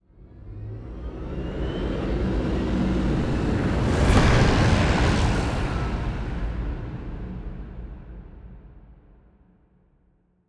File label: ship cruiser move; Stellaris; toxoids; ships